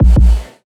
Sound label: kick oneshot, Pop; Drums; SD PASADENA Pop Sample Pack; Slate